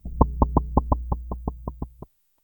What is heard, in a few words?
a short light and airy